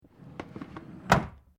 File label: Drawer Plastic Cabinet Close Fast; Various domestic drawers; Household